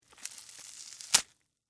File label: Tear Rip Watermelon, Texture, Tear, Rip, Watermelon, Flesh, Fruit; Ripping; BlastWave